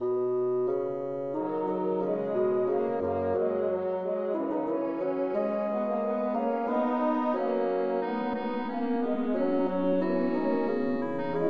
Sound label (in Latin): Quia Bas; J.Kuhnau